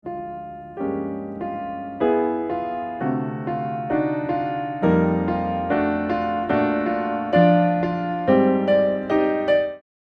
melodic seq; piano

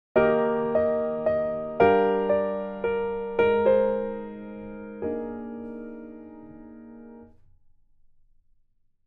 diatonic composition

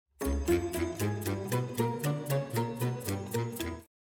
danger motif; film noir score

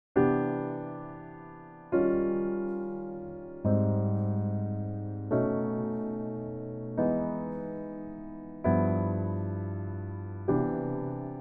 coltrane changes C, Piano

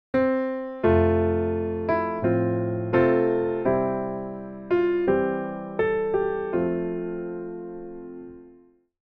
auld lang syne inversions

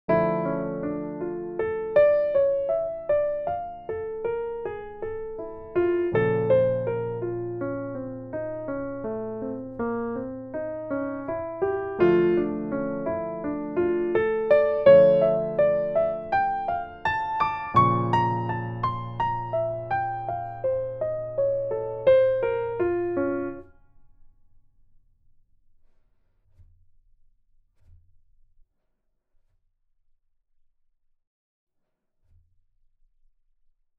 arpeggios plus enclosures, piano

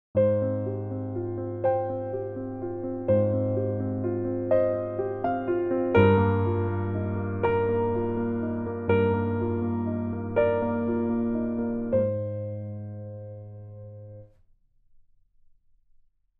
Crossovers Melody

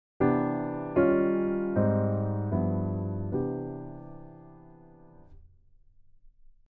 dameron changes

